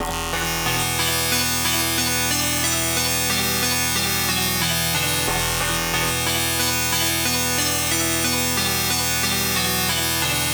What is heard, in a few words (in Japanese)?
エレピ